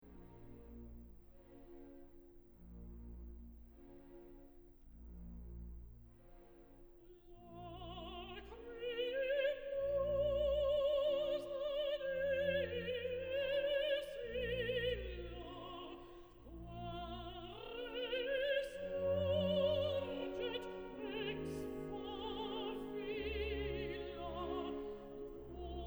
Lacrymosa; requiem verdi

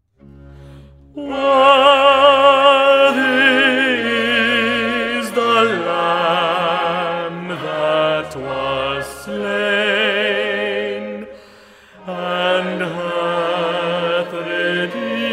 Worthy is the Lamb that was slain bassi; Messiah; handel messiah

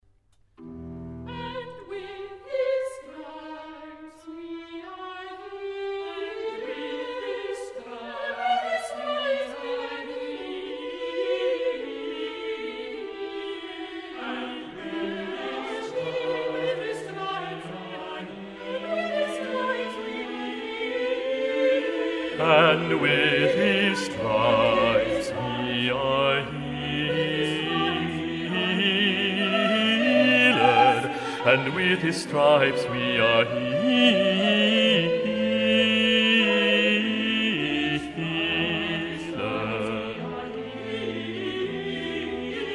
And with his stripes we are healed bassi; Messiah; handel messiah

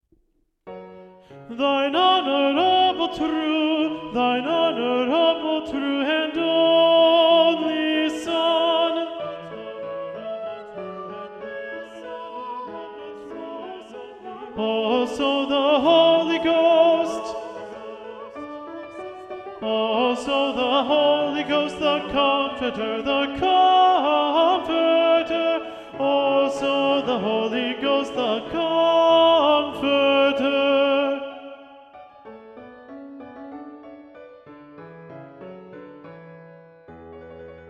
Tenor; Handel; Te Deum

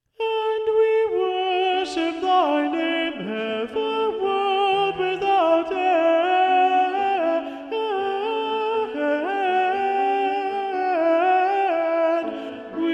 Alto; And we worship Thy name; Handel; Te Deum

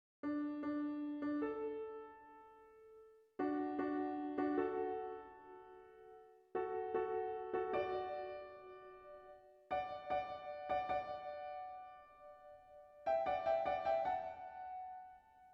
Alto; We therefore pray thee; Handel; Te Deum

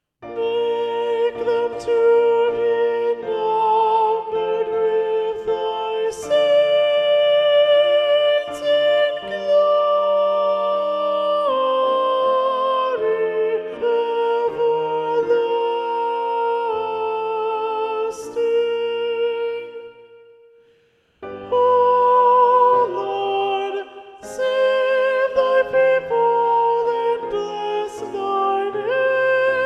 Make them to be numbered Soprano; Handel; Te Deum

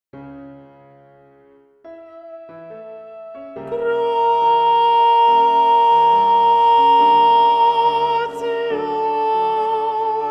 Gloria Gratias Soprano; Mozart; Mass Cmin

